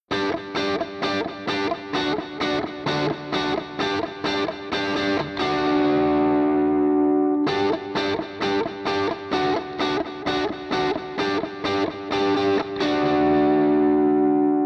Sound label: Gtrs Mixed